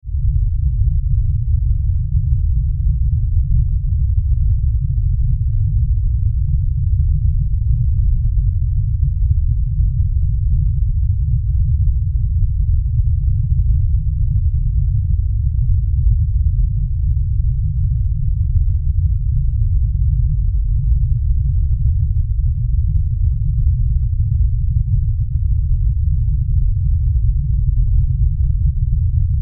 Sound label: Weather Earthquake Rumble; Rumble Sound Effects; Rumbles (Sound Effects:Sound Design)